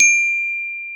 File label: D5 glock; InVision Interactive; Lightware VOL-1; Instruments & Percussions; GLOCKENSPIEL